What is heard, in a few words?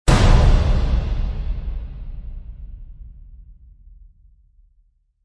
War Strike; Gothic Storm Music